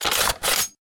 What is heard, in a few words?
largerifle boltaction